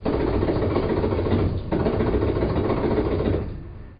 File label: Motor 2, train; nankai6000; OpenBVE